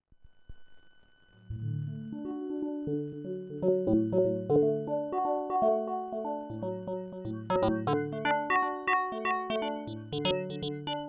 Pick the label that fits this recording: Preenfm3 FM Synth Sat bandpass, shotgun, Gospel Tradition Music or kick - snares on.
Preenfm3 FM Synth Sat bandpass